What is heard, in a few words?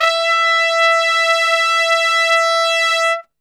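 E 3 trumpet straight, Brass; Best Service; Brass Super Section; TRP STRGHT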